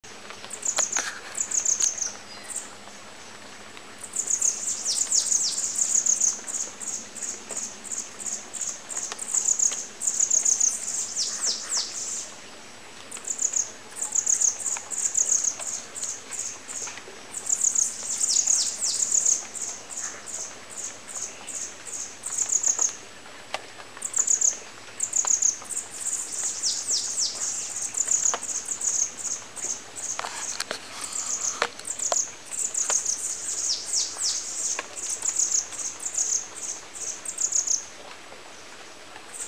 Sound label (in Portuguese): Canto Beija flor de peito azul